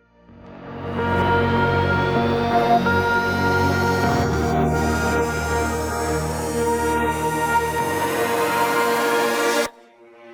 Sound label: Instrumental